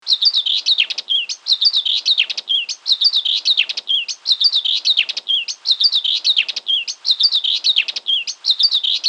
whitethroat

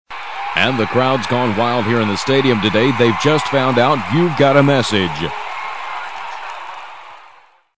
sports message

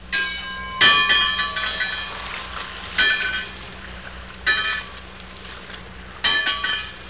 nautical

